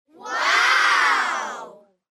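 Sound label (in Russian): вау детской группы; Вау звук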